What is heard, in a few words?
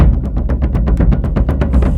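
Music Shield; from dust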